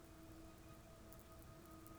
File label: pulses, BALAENOPTERA; MONACO 2022-07